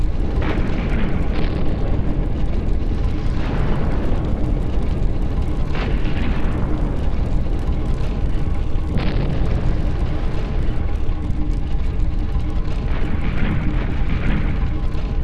Crater Tower Escape Ambiance

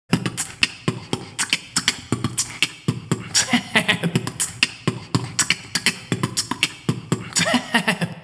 beatbox 16 beats; ruins

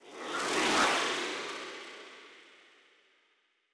Harpoon Skill; Legend of Keepers (gamerip) (2019)

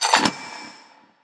Medieval Helmet Close; Clawberta

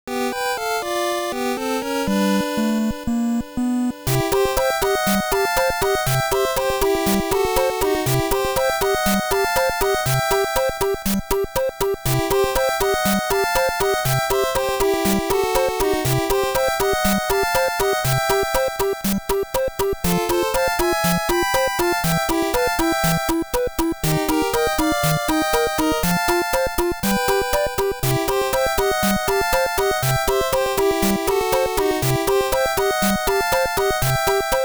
Underwater BGM (Hurry Up!); Super Mario Bros 3

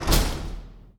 Gate Open

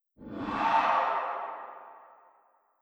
Morale

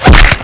Kick

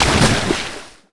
swimming splash body; MiniGames

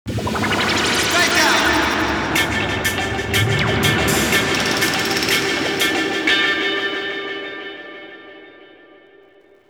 Str G#

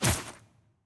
Item Buy

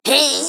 Rogue Prepare; Pecheneg; Folk Hero

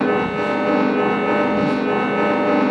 Walk

